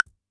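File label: wood block, PERC; Yamaha DD-65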